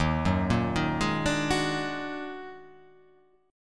Eb Minor Major 11th; EbmM11; arpeggiated; JGuitar

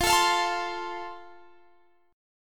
F Major, Guitar Standard; strummed

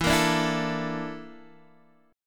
Bb Major 7th Flat 5th

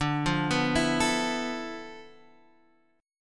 D-Minor 6th Add 9th, D, Guitar, Half Step Down

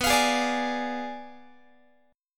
B Diminished 7th B down Guitar; strummed; tuning 4A,4E,5A,5D,5G#,6C#

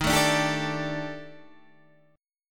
Eb Major 7th Flat 5th, Guitar Open D

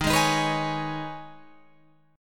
Eb 7th Suspended 4th Sharp 5th, Guitar, Open F; Eb7sus4#5; strummed

